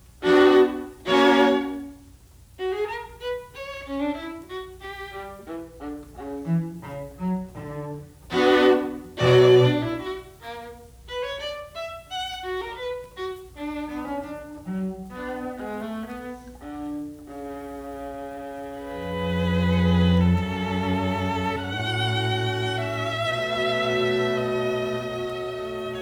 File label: String Quartet No14 Op131; Beethoven; Paganini Quartett